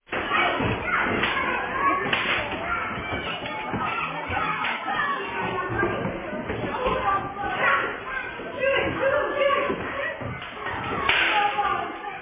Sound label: gunfire; blackgangchine